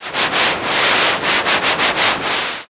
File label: river